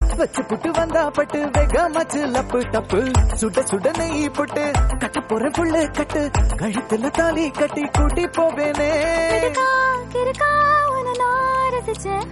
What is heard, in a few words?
Tamil Song